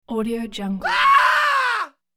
short male terror scream

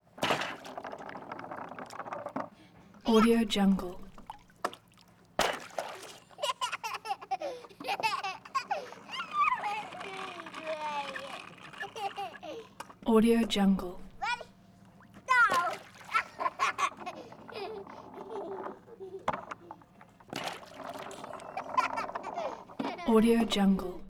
child playing rolling toys splashing in water

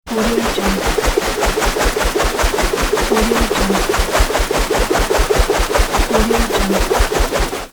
lasso twisting

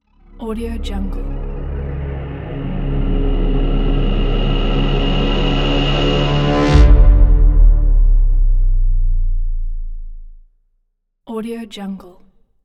brass rise

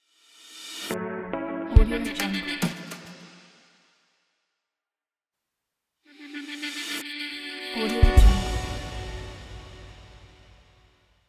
stylish intro

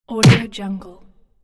body punch hit

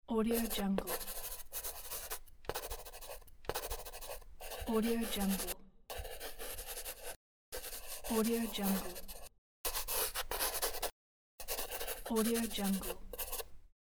writing

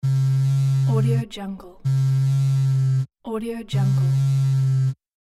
cell phone vibrate

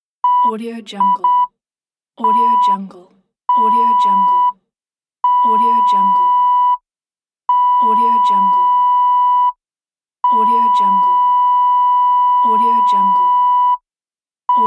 censor beep; ducentije

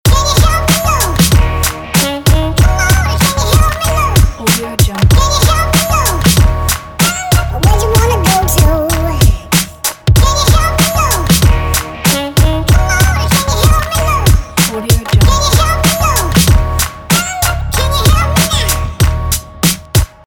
hiphop loop

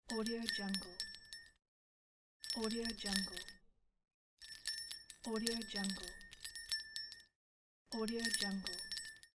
small bell

